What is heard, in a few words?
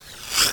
Breath Noise Doppler